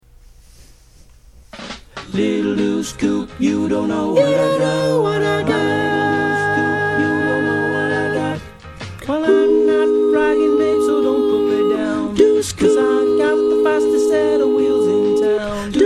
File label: Soprano; Choir Harmonix; Harmonies